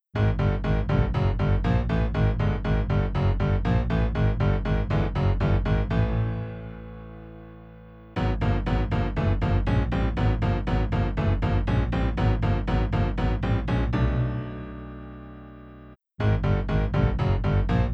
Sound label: Piano; Kinpaku no Piano; kuro no juusan gamerip